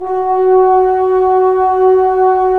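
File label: brass trombones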